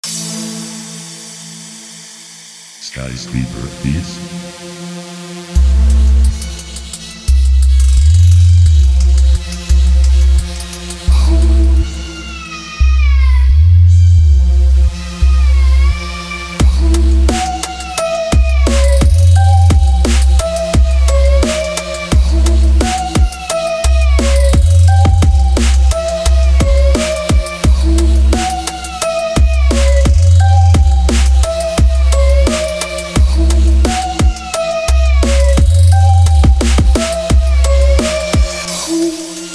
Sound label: beats